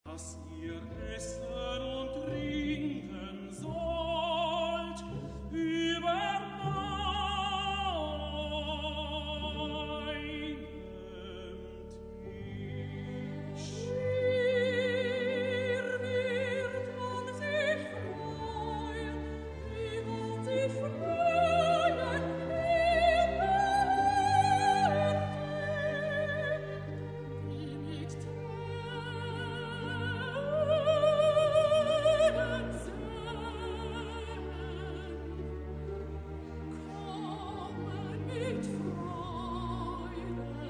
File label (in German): Soli; Erntefeier; Selig ist